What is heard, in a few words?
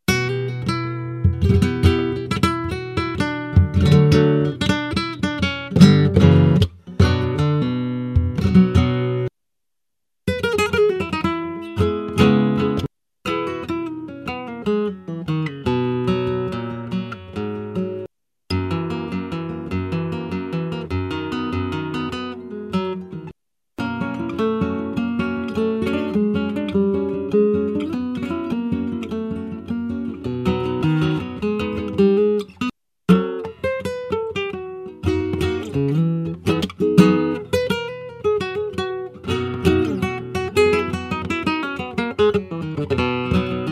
flam